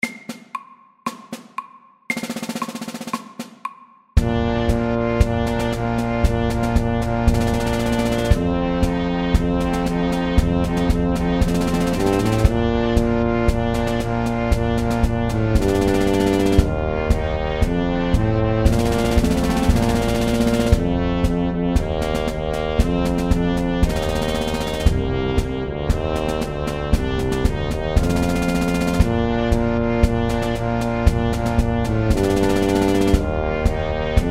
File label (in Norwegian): Messingmarsj FAST 116